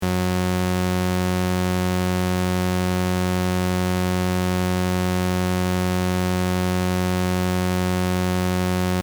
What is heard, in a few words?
sync test; paper synth